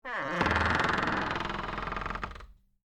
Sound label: Door Creak Wood, Foley, Door, Creak, Wood